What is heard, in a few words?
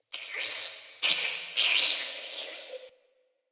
interior squish gurgle; Ambience; Misc Indoor; DE; Warframe; Infested Eris